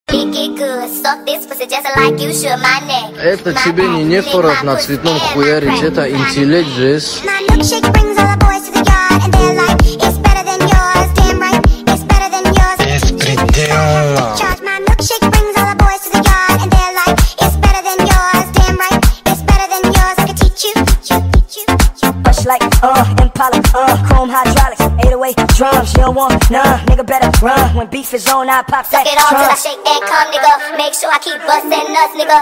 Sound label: sped up remix